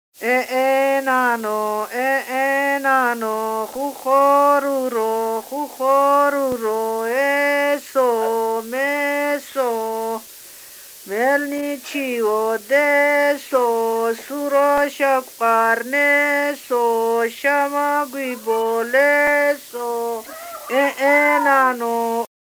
Nana, Agmosavletii; Khevi; Mokheuri Nana 2

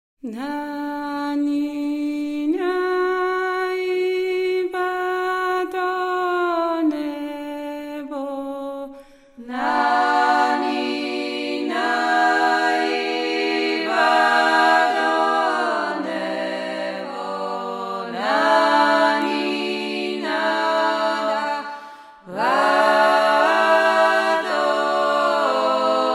batonebis nanina imereti

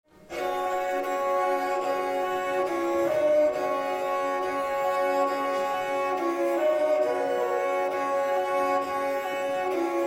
Nanila svaneti

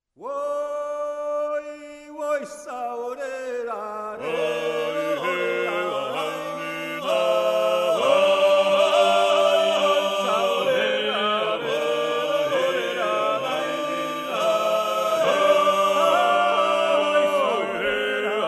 viosa achara

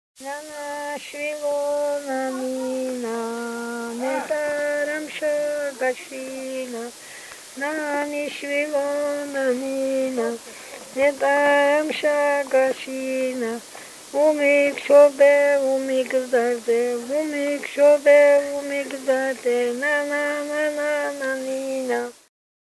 Nana Kartl Kakheti; Agmosavleti

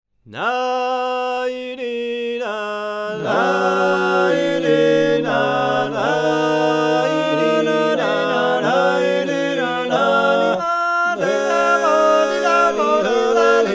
Nanina

